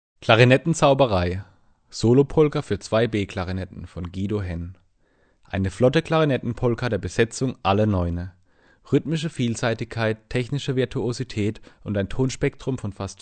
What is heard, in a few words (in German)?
klarinettenzauberei